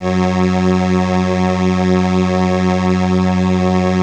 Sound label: Crumar; Masterbits - Vintage Voltage; CRUMAR PERF